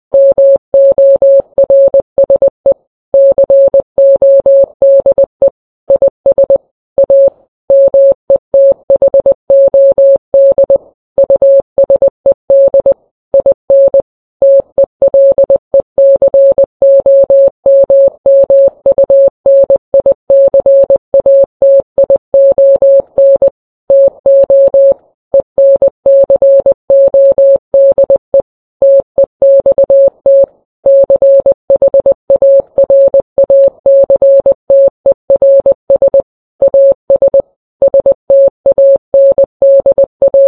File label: morse code; ADJ